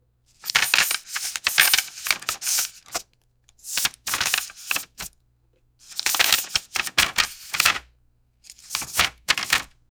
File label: the sound of counting pap, money